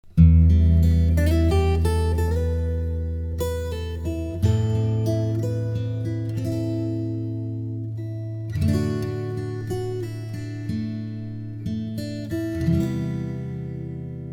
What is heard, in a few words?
3 pickup rev